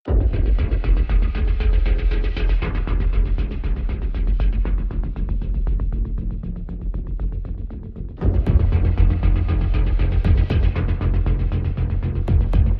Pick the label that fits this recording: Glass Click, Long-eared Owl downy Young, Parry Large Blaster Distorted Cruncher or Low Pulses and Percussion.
Low Pulses and Percussion